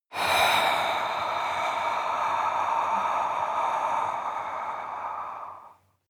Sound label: breath female exhale heavy scratch